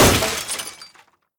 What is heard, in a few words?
Building Particle Falls On Floor